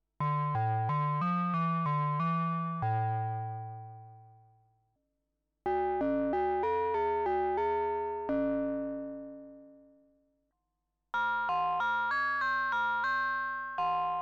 MOOG MATRIARCH Ringmodulation Oktavlagen

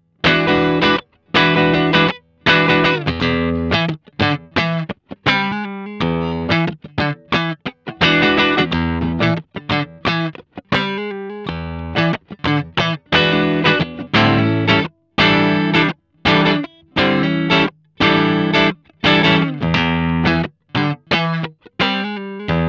Referenz Clean; Profiling versus Capturing

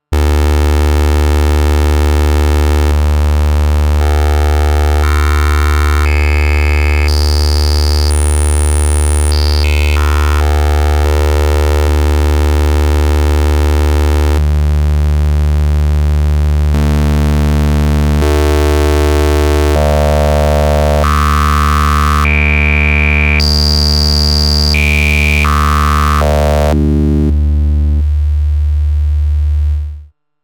Waverazor Dual Oscillator Slice Tune Octave mit und ohne Constant Polarity; MOK

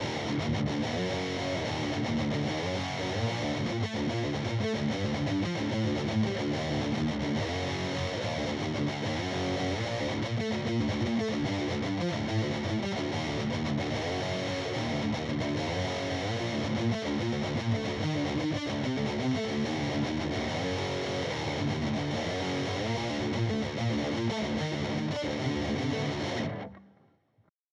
Boss GT-1000 Core Dual Rectifier Kompressor

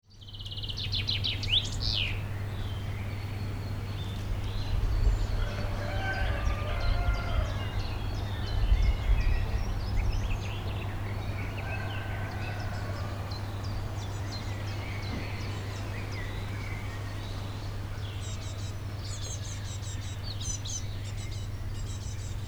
Athmo

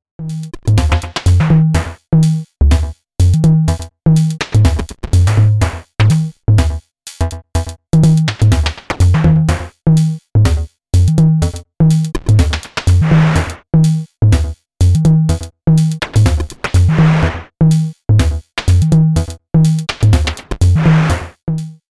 Drum Eurorackmodul Veschiedene Modulationen; Erica Synths LXR

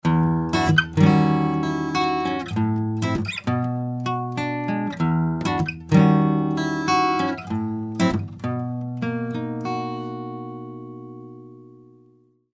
Shelford Channel Western Gitarre Silk Red; Rupert Neve Designs

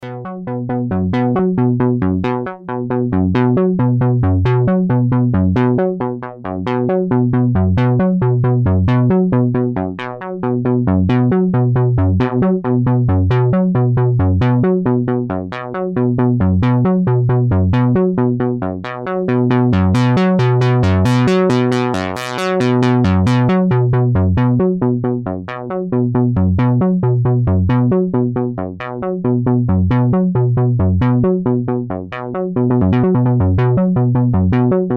Sequencer mit Basic Expandern

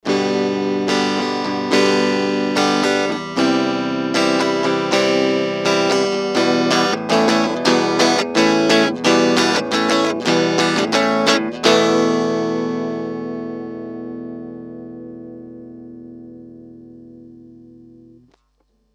Gitarre DI; GAP Pre 73 DLX Premier; Gain 25 HP 0

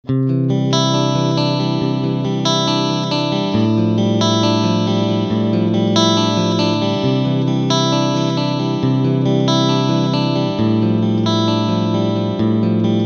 Clean Singlecoil beide

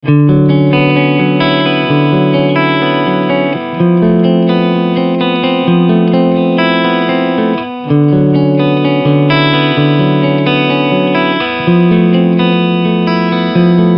Clean Picking beide PUs

